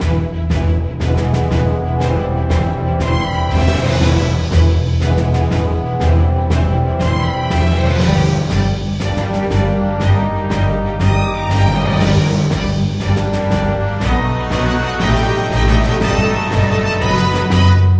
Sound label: Music Minigame; cartoon network punch time explosion xl 2011 gamerip